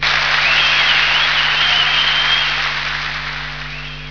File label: aplause